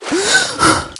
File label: respawn, ambient random